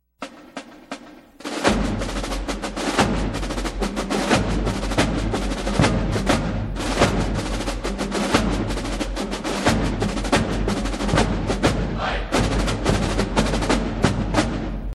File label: Battlecry